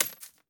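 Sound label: bullet case bounce 556 grass; gmod; viper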